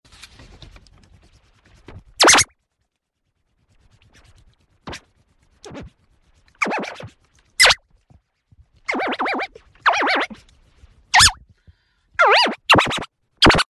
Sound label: funny air horn